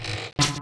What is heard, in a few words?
attack bow